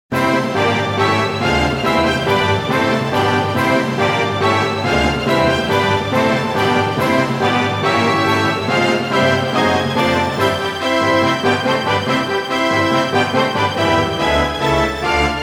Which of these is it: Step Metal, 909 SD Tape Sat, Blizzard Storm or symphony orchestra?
symphony orchestra